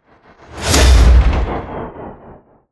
Whooshes Impacts Impact; soundbits

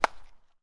sport baseball hit; martinimeniscus

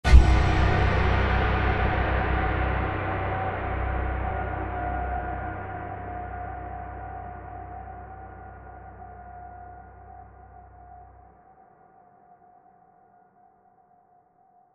horror hit dark creepy; zapsplat

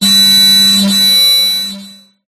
sound design metallic shrill burst light reverb; zapsplat

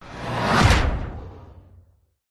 whoosh; Audio Mangler

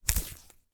wrapping paper tube film remove; foley

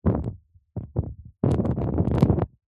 household match flame blow out 2x attempts

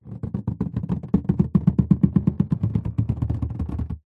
balloon inflated handle creak muffled; foley; zapsplat

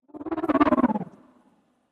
GHOSTS Whoosh Stutter; Advent

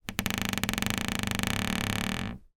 household door creak small wooden

